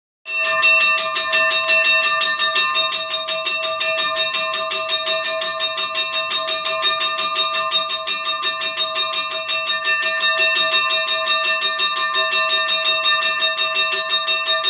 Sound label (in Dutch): bel